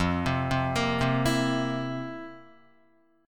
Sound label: F Major 7th Suspended 4th F, Guitar; FM7sus4 arpeggiated; JGuitar